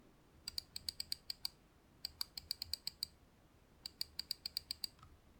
Glorious Model i Sniperbutton

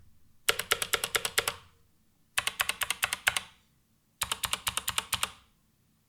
MSI GK71 Sonic Blue 5 x Space Backspace Enter

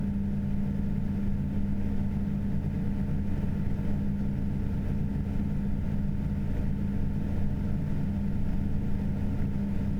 Max RPM

